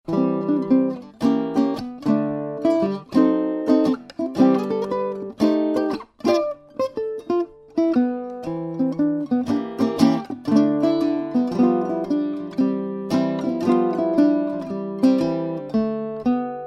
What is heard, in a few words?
Archtop Ukulele